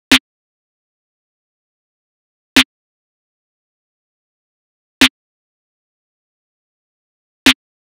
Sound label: Drum Loop - 98 BPM Snare; Loop Stems; Cymatics; Vibes II Drum Loop Collection; Trails